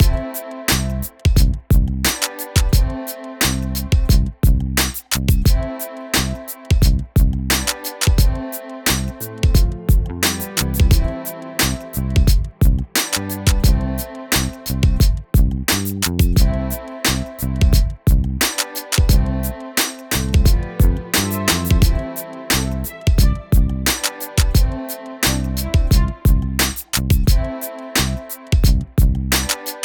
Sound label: 88bpm C major